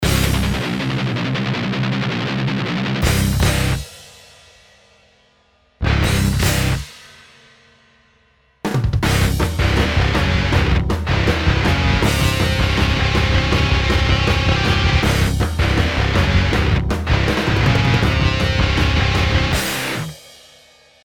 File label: HH Tube, metal